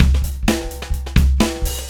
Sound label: 126 Pop Drums; Pop Rock